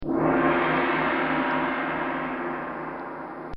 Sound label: Chinese Gong; Brass (Sound Effects:Musical); This sound effect was recorded in a custom built sound booth